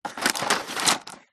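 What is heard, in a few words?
household medicine tablets blister pack large place into box others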